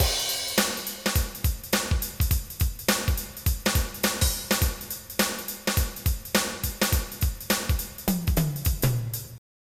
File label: shuffle; fruity; flstudio; MIDI Music File; General MIDI